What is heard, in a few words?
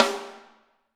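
snare drum, Drums; Bob Clearmountain Drums I; SD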